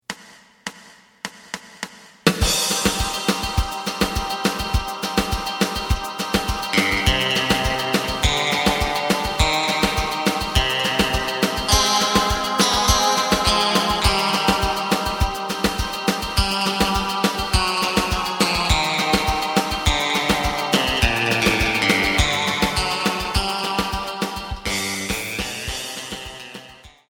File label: bass